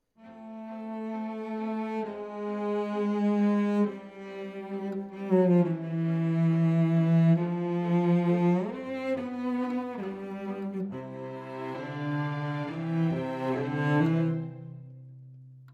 Cello Solo

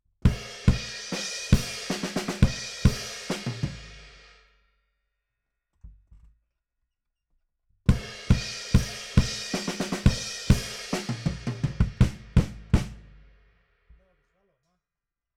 BD Out

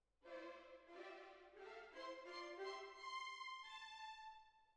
Cbasses

